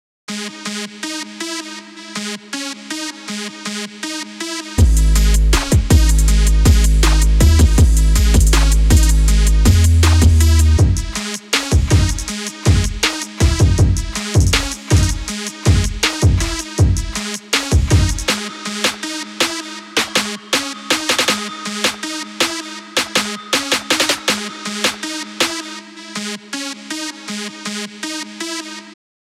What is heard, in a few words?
Mobile Ringtone; KABU